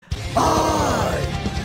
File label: the eagle voice